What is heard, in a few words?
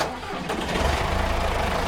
start, tractor; stardew valley mods; TractorMod